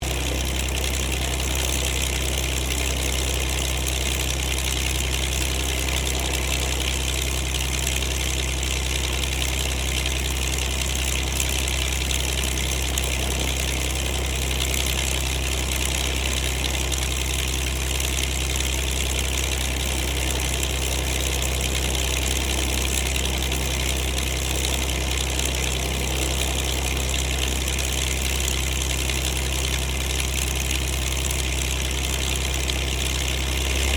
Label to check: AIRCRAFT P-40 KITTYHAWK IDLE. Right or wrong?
Right